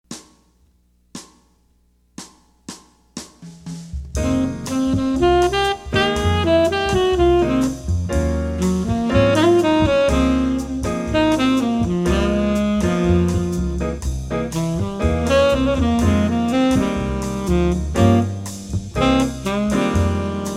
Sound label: Bossa, trumpet; 15 Easy Jazz Etudes; CM Level 3 Solos (jazz)